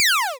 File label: lazer, 8-Bit